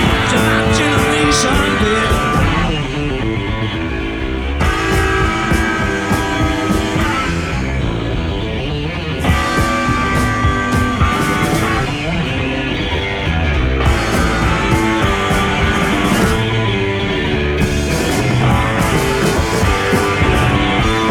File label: Bass Solos; Live In Kilburn 1977